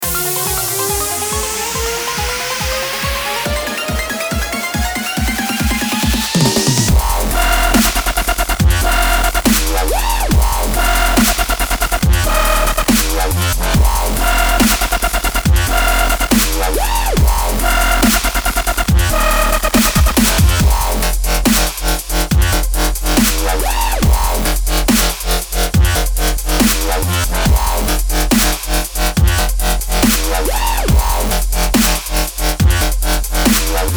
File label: Dubstep Massive Presets